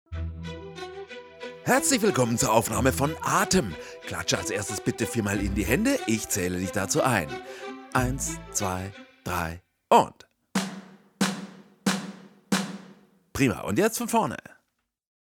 Playback fuer Video - Sopran

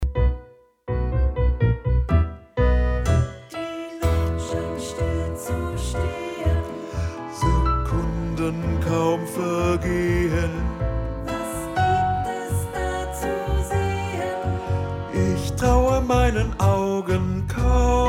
Tango-Tanz - Bass

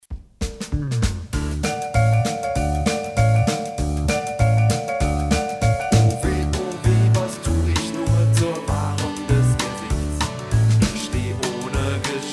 Sopran

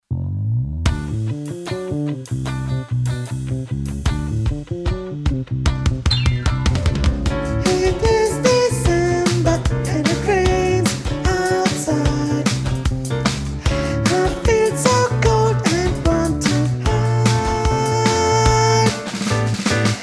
Sopran

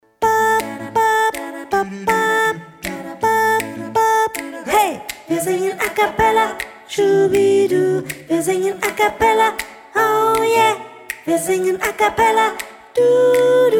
Acappella - Sopran

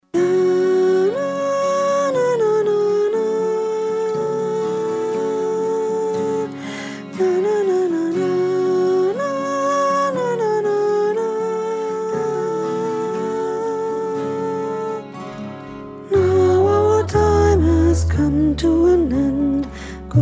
Sopran

